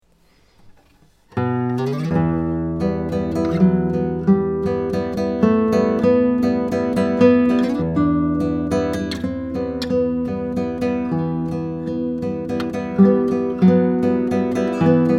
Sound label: prelude; hopf